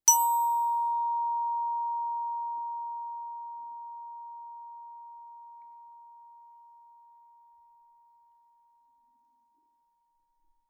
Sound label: bells brass ff Bb5 stereo, Percussion